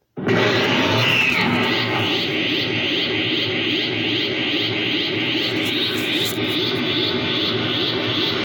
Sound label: super saiyan power up